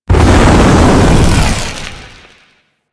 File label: Space Fire Debris; Ambience; Space Battles; Warframe